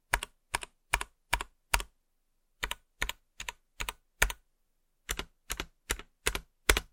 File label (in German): schwarz multikeys